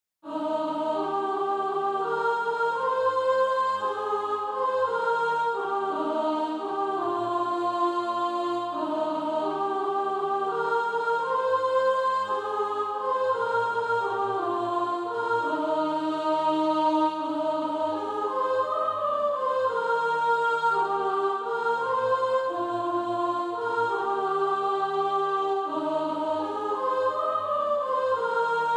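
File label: soprano, Practice Music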